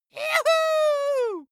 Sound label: dwarf cheer "juhu"